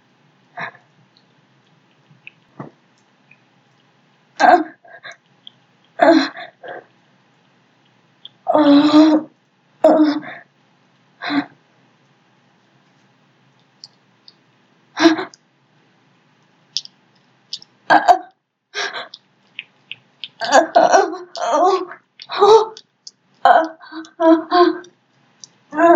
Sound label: moaning